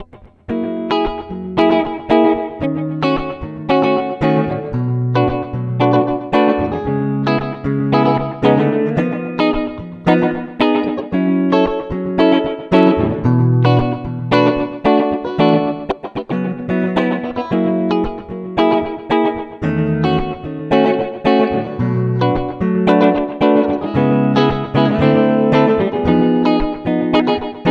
echoo

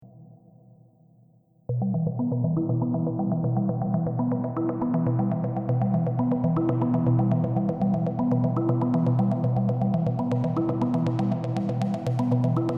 Ambient

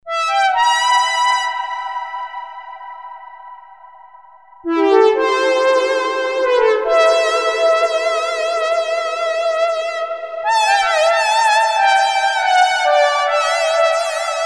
Lead

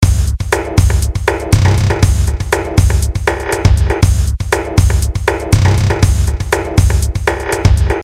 Ambient Loop Original

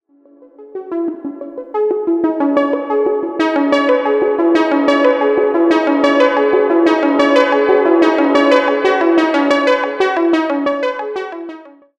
plate norm

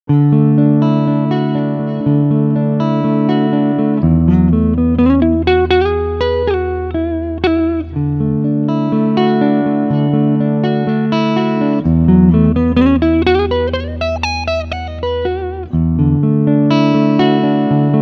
Cleanpicking Fronthumbucker

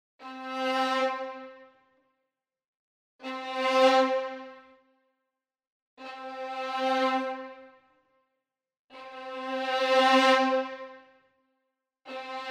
Agitato Violins Dynamic

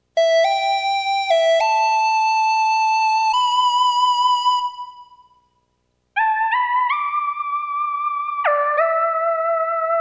Synth

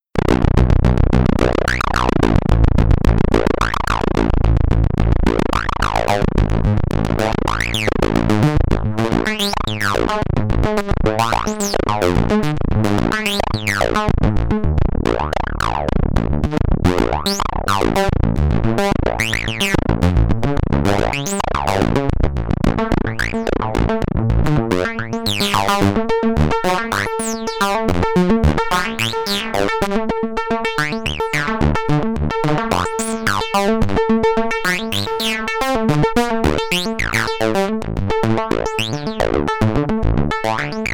Pulse 2 Preset Needles Arp

